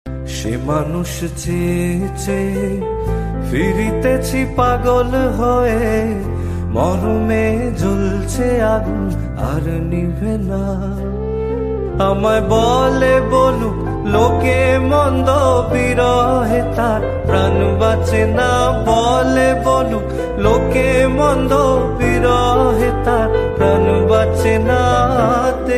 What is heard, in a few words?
Bengali Ringtone